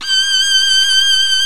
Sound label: SOLO STRINGS